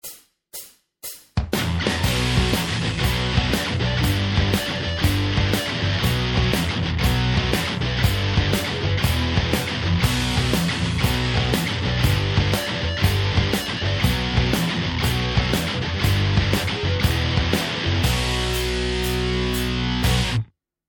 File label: guitar basics tapping backing